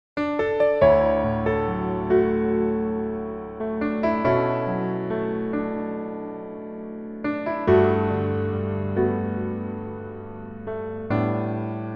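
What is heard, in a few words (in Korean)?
Piano version 멜로디MR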